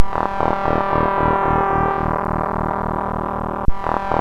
sid CSG8580; Silent Memories; hvsc

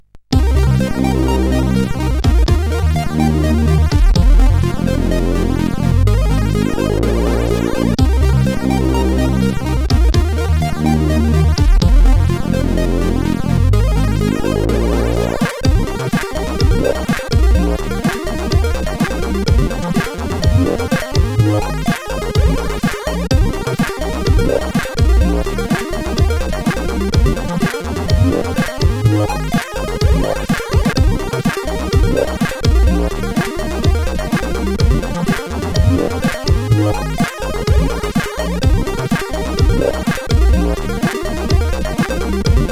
sid CSG8580R5; Silly Invitation

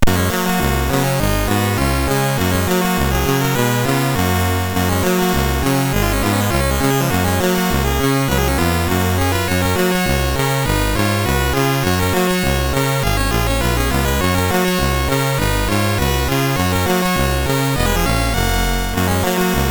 Super Cup Football, MOS6581R3; hvsc

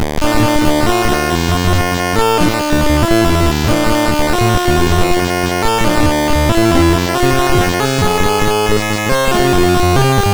Randomly generated music BASIC, sid MOS6581R2

